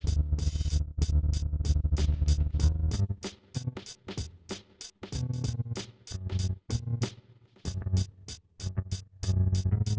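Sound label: with drums inst sim; SASSEC07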